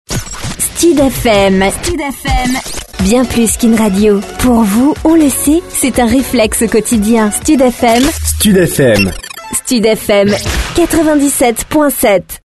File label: JINGLE; STUD FM; Voix punchy, souriante